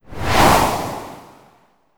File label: Ice Attack Swoosh; Ice Wizz